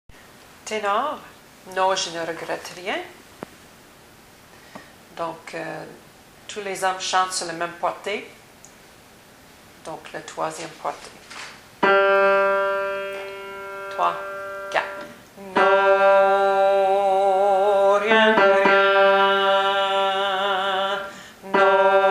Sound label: tenors; chorale